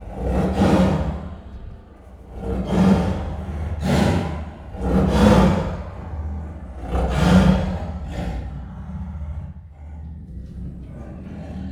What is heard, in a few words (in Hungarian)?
a végére kissé lehiggadnak, szibériai tigris; állathangok; miskolci zoo 2018